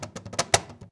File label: CAJ FILL, cajon fill; Univers Sons - Raricussions